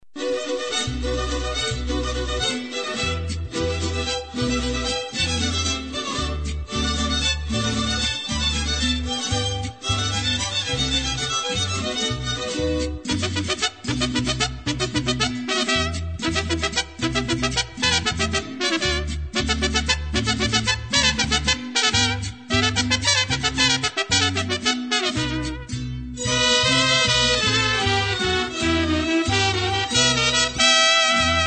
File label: MARIACHI